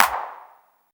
ambient clap A key; single hits one shots

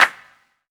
reverb clap A key

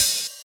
open hi hat d sharp key

